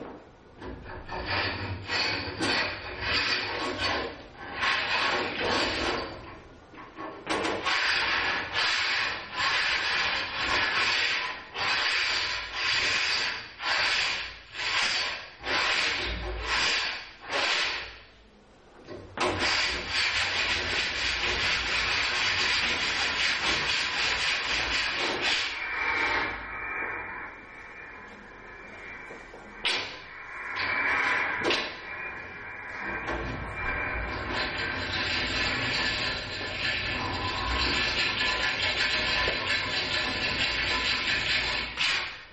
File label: scratching sound